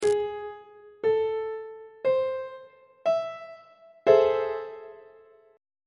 g double sharp minor major 7th 3rd inversion on treble clef